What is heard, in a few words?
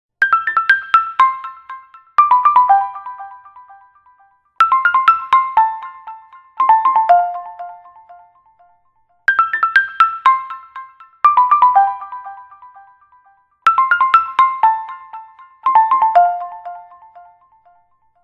Ring Sapphire Ring; Microsoft; Windows Mobile ringtones; Matthew Bennett